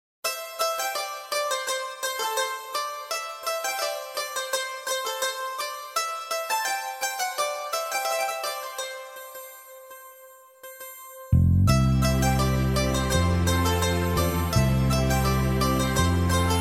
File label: melodious piano ringtone; iphone